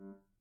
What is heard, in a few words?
Grand Piano Main Release G1, Kawai; Legacy